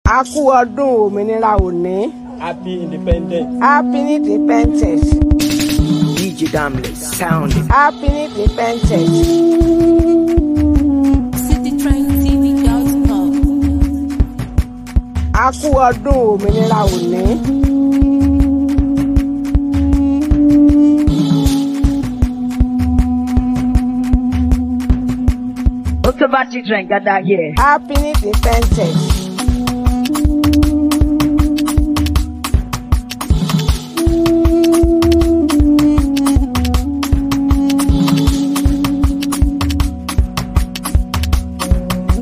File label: Happy Independence Dance Beat